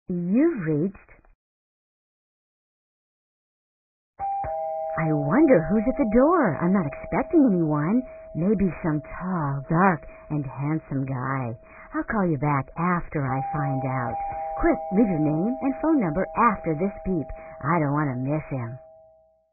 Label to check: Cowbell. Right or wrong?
Wrong